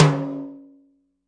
classic hh; Hip Hop; STYLE KITS; Classic Hip Hop Mega Kit

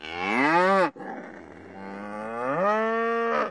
moo tag team, cow; Cow Construction Kit

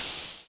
Syn Dr, Overloaded Synthetic Drums; Hip Hop; style kits

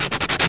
Eraser; Cheesy Lo-Fi Sound Effects

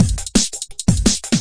170 brightcymbal jungle; 161 to 180 bpm

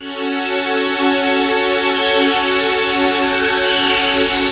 minor bright, pads

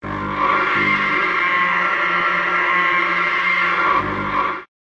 deep guitar journey; Pads

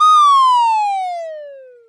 bomb

